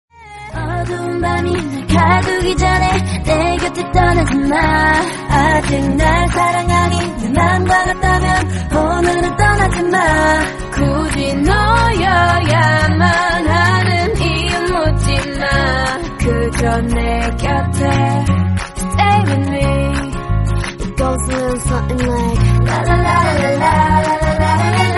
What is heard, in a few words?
Korean English Song